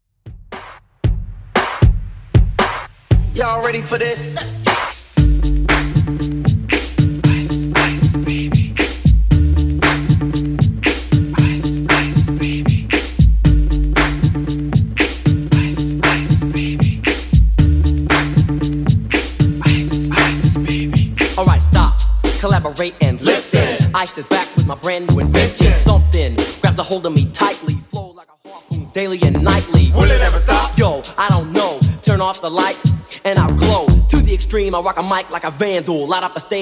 90s 116 bpm

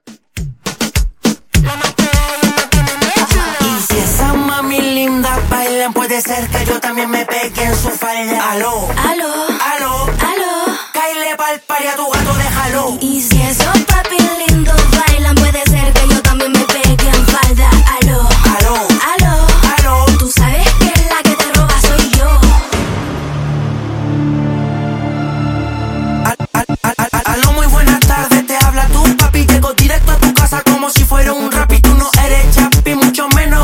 Top 40 Intro Outro 102bpm

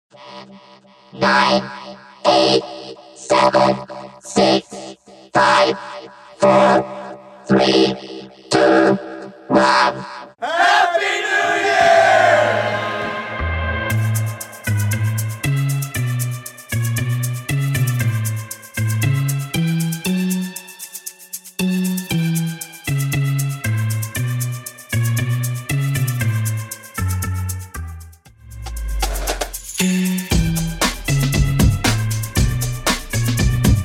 NYE Countdown 117bpm

